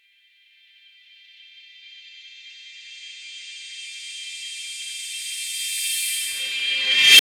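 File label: shrill ring, abrupt cutoff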